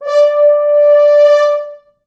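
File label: French horns, four, d4; East West; Quantum Leap Horns Sax; 4 FrHorns fts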